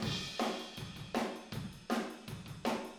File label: Take Your Toms Off Chorus Room ST, Drums; Sonic Foundry (Sony Creative Software); Drums from the Big Room The Mixes; Demo Projects